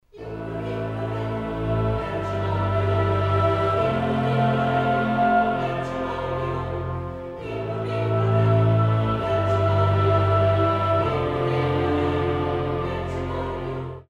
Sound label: lost round; music kits custom